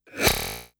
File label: Glitch, noise